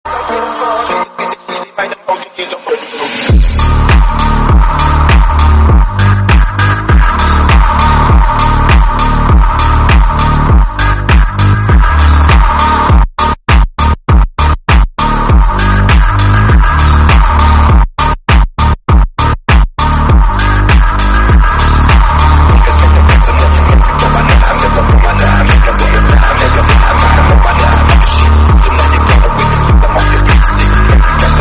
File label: slowed soul instrumental